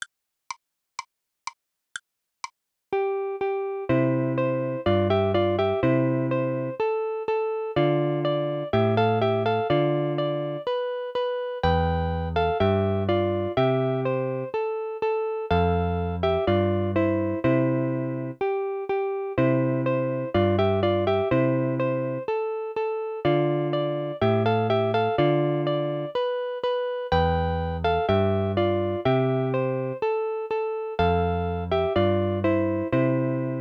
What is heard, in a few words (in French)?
trio en do majeur bande son